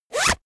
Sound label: zipper open close